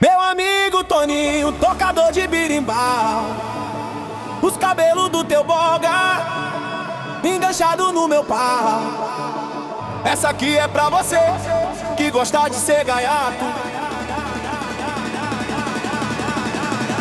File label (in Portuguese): Berimbau Versão Technobrega